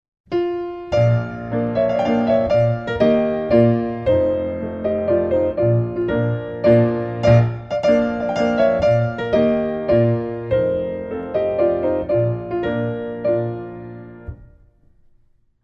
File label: mazurka; Chopin